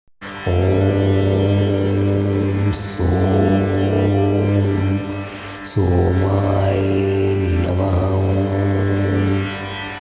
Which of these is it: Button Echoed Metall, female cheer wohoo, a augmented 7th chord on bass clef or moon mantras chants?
moon mantras chants